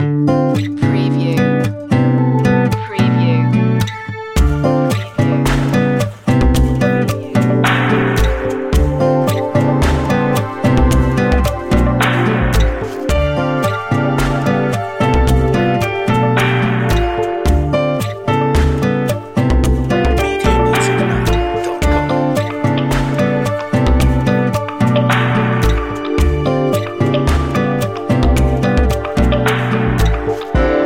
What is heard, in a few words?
lounge water